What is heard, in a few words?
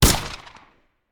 Musket; Sound Ideas; Guns